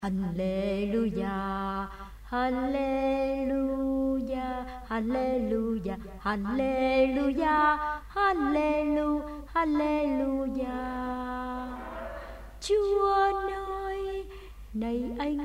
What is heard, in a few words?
alto basso